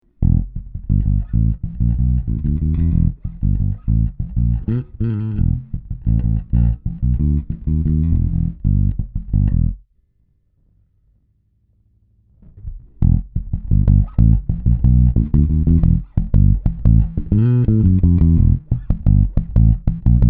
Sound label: Darkglass Microtubes X7 Low Comp